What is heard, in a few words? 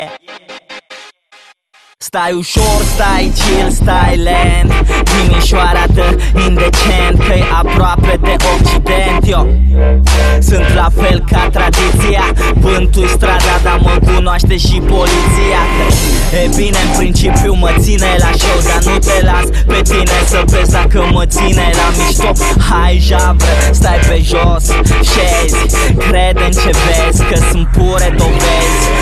Hip Hop